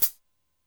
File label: Cym Soft Foot, Drum Machines Alesis D4